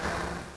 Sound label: air zero; OpenBVE